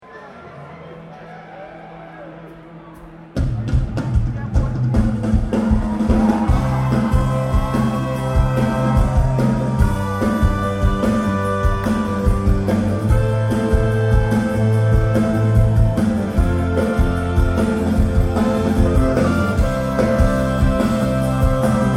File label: Ring The Bells